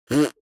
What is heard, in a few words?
human mouth beatbox effect suck in damped; smartsoundfx